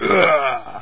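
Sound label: grumbling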